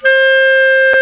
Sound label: Clarinet; CMI